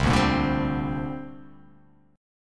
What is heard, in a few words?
C 7th Suspended 2nd